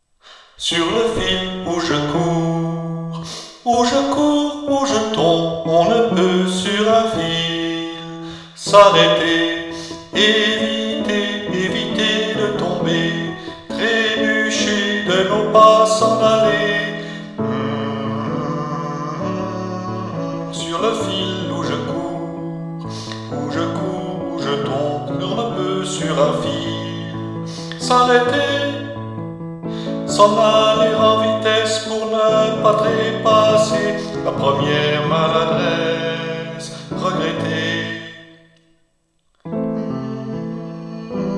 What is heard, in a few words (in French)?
Sur le fil baryton